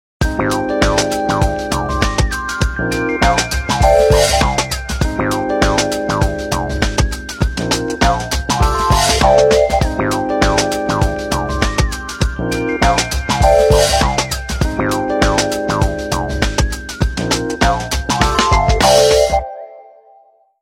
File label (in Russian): originalnye ringtony Nokia 5310 XpressMusic